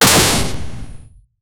cartoon style cannon firi

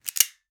he reached out carefully, gun

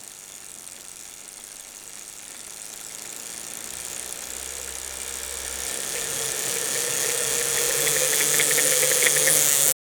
full speed, bike

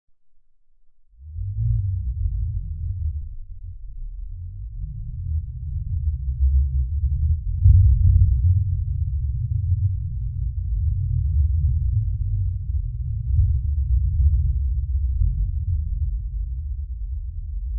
fart; hitch trailblazer; human sounds

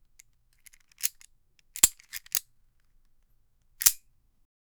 quickly taking the revolv

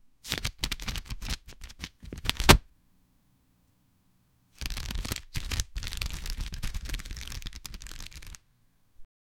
cards being shuffled

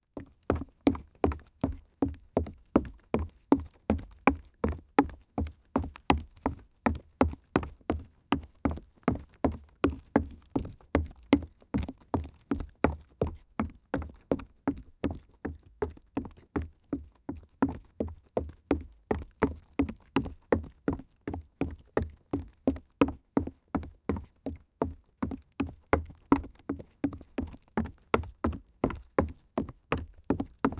running on wood; active

